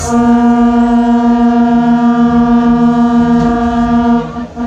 Duits Start Horn; Kermis Horns

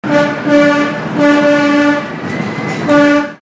Disco Train Hoorn, Kermis

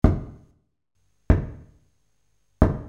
kloppen; Spookslot Tunes Effecten

